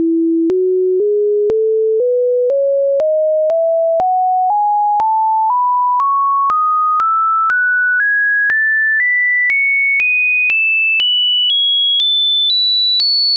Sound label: doremi